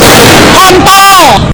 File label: bass boosted